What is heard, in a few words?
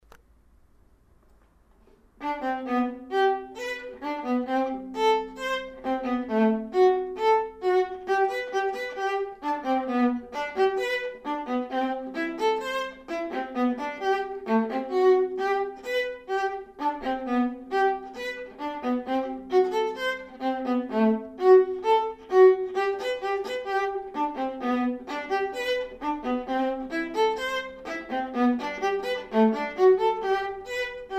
Glockenspiel; melbay; Mozart